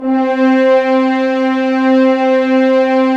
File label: Horns; Roland - LA Composer vol. 4